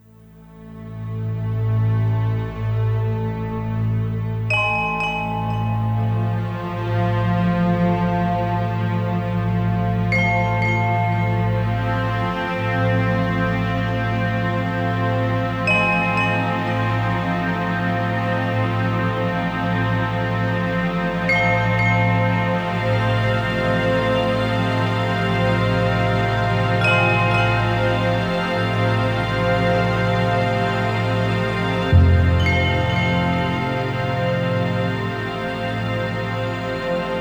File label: Suspense; Pokemon Legends Arceus